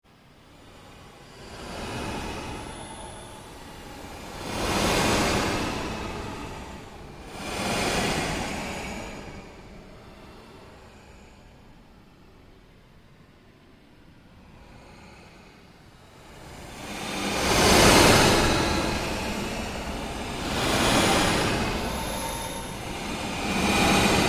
Created Ambience Fluctuation, Rise And Dive Sound Effects; Sound effects created by manipulating other sounds; Rises and Dives